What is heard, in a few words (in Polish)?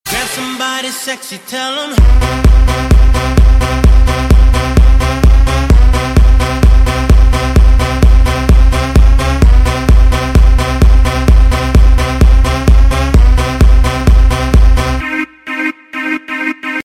klubowe zm